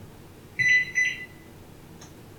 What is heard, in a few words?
bip bip